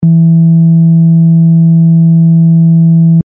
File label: wave Meter Smooth Tone; wave interference; waves intro